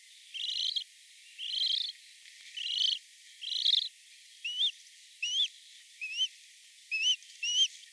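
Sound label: Grus japonensis juvenile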